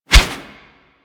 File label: Brawl Whoosh E; Warframe; Brawling